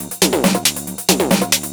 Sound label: drm 138, Techno, drum-loops; Techno ID